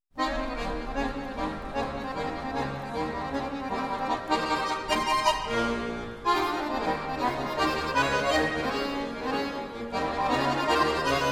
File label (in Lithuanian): Akordeonų duetas; E.Ettore; Eduardas Gabny